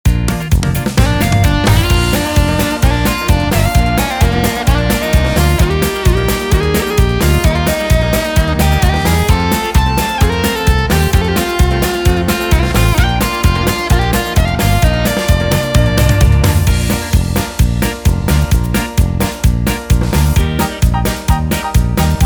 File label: Style Yamaha; yamaha tyros 5